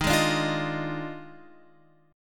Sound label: Eb Major 7th Suspended 2nd Suspended 4th Eb Guitar Open F; EbM7sus2sus4; strummed